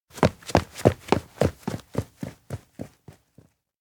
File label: steps indoor vet burst out; chiharu; anemoi パッケージ版 1.1